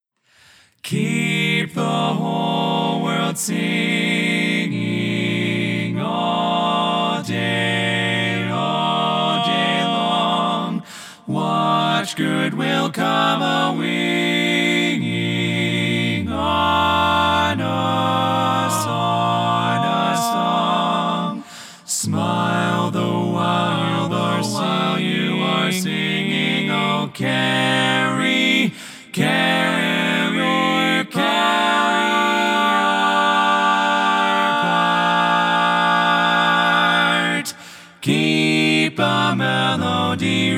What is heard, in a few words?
Full Mix